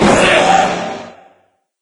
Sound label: move start; doom3; sabaoth